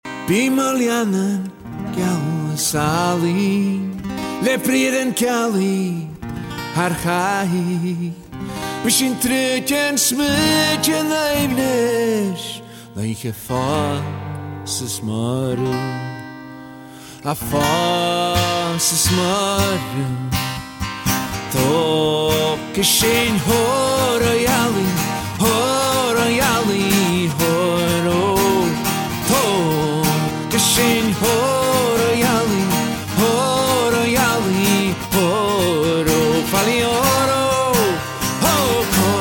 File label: acoustic